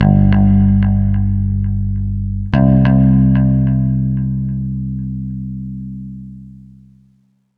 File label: Oneshot Bass guitar; Zombie Army 4 Dead War